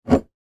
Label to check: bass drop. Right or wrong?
Wrong